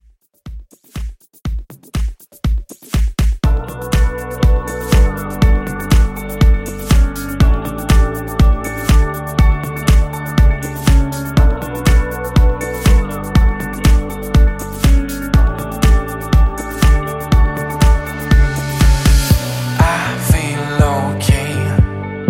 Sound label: Mainstream Pop Redrum; Remixfreakz